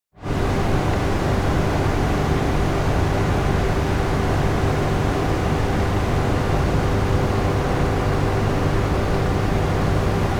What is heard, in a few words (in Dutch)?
Stoommachine; verkeer algemeen; KMP Film Video Sound Effects